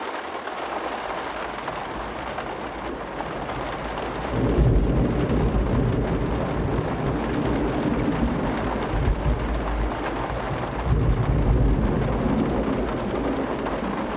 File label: Storm Closed Window Loop; Storm Lv2